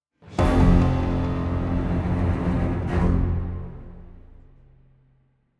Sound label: Combat Room Intro Stinger; wellspring